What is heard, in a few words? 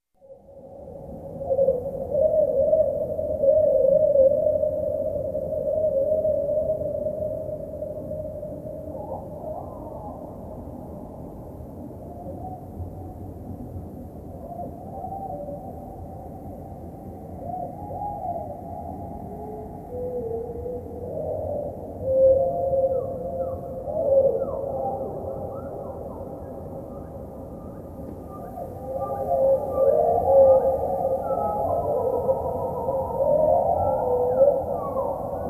Bird Bg Speed Thro